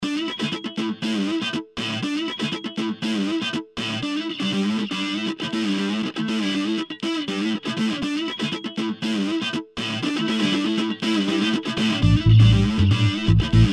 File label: GUITAR